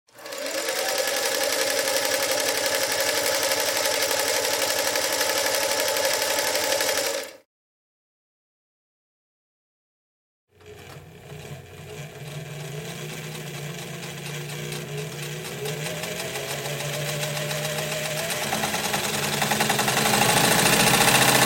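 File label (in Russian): работа швейной машинки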